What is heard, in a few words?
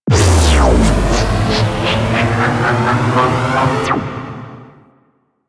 Capital Ship Laser Fire; Warframe; Corpus